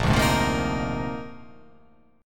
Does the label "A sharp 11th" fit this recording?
Yes